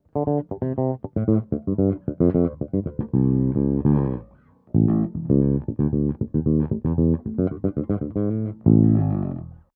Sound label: Steg Finger, bass